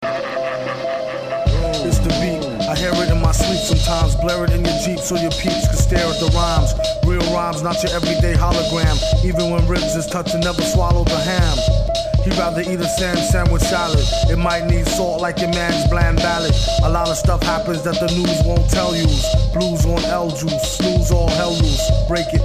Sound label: vomit